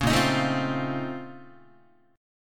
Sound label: A sharp Minor Major 11th, Guitar; strummed; 4,6,6,7,4,4